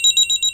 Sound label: mail